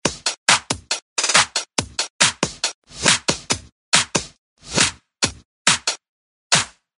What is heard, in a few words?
Drum Slide; HTC HD7